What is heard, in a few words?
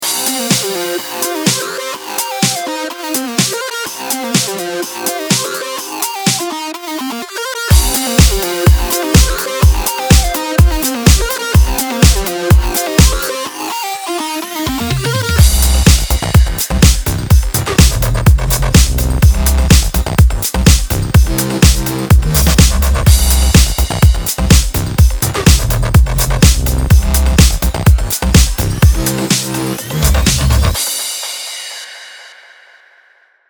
electro house; loops; logic pro